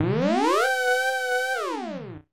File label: Teleport; Legend of Zelda, The - A Link to the Past